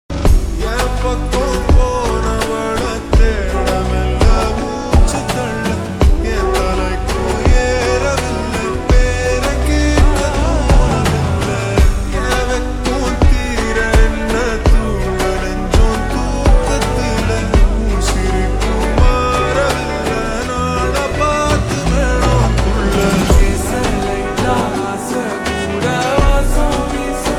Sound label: Slowed Reverb